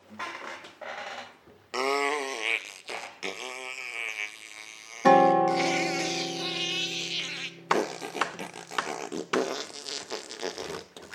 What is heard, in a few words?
noise instrumental